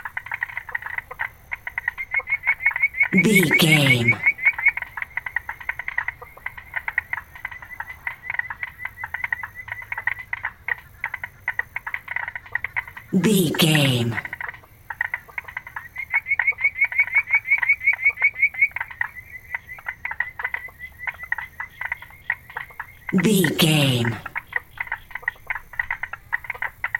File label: swamp ambience frogs loop